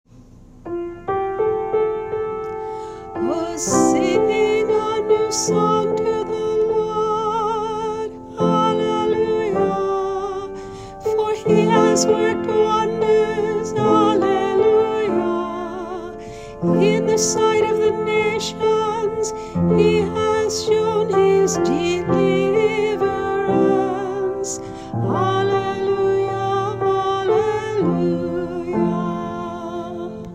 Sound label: Antiphon; 5th Sunday Easter